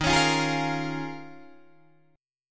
D sharp 7th Suspended 2nd Suspended 4th, Guitar, DADGAD